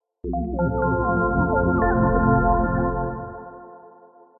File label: synth space weird synthy sci fi melody activate